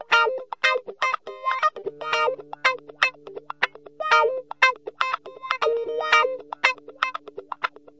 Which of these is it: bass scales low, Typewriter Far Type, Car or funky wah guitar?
funky wah guitar